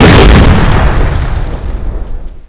rocket explode; XDM

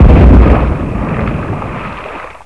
explode, weapons; XDM

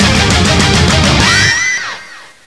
score sound; XDM

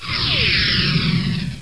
long jump; XDM

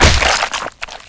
bust flesh, debris; XDM

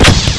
gun fire, weapons; XDM

